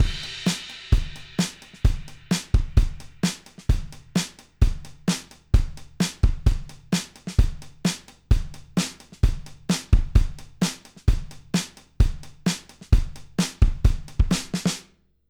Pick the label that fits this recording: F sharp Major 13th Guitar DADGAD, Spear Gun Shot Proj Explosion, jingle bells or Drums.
Drums